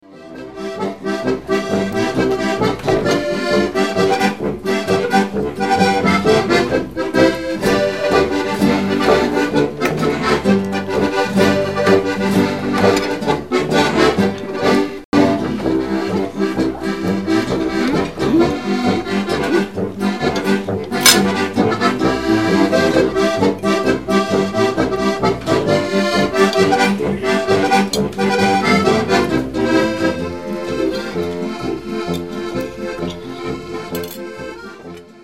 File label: Polka